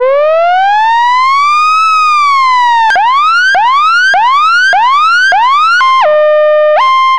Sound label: emergency alert siren